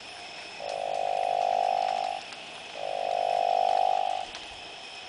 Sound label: Proceratophrys boiei